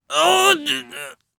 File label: VO Death; VIP